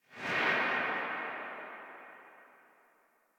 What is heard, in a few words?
Rifle Tail Secondary B; Weapons; Warframe; Tenno; Bard Rifle